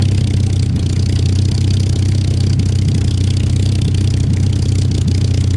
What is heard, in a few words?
spitfire rear, engines; War Thunder